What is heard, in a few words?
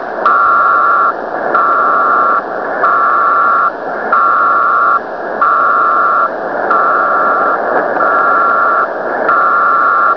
pactor 2